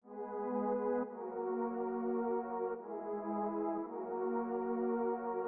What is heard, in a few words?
B, 175bpm, pad